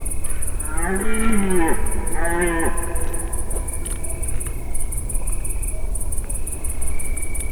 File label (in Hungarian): halk lépések, gímszarvas; termeszetben